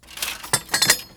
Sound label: repair sound